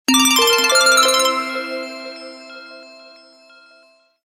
Cloudy Tone, Samsung phonetones; GT-C5510

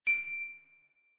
ui power down mono, Motorola ROKR EM35; ui sounds

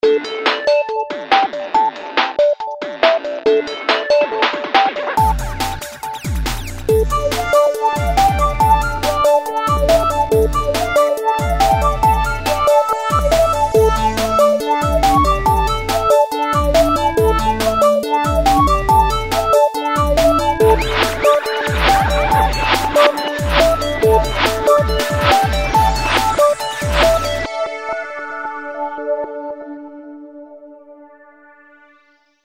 Ringtone, Samsung GT-C3110

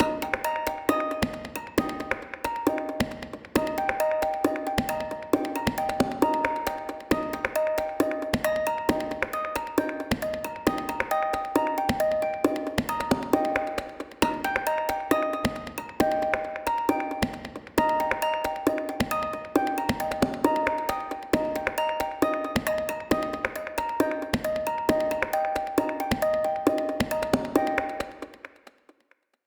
ringer tailwind, ringtones; Mozilla Boot2Gecko

